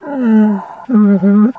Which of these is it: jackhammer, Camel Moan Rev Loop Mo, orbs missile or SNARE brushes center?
Camel Moan Rev Loop Mo